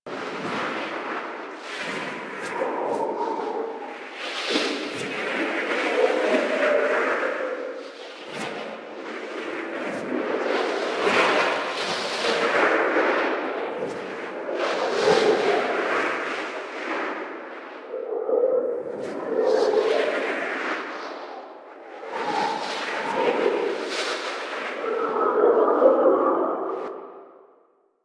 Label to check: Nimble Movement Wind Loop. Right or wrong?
Right